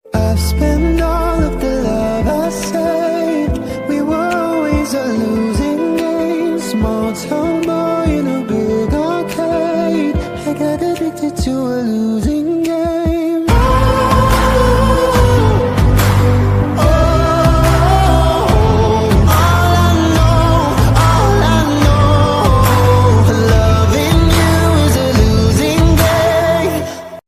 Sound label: english ringtone